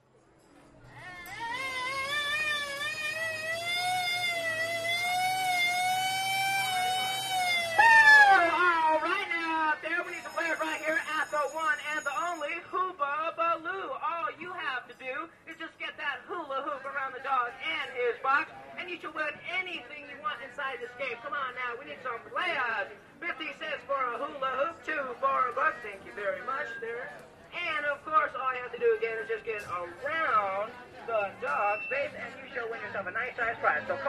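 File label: Carnival Amusement Park, rides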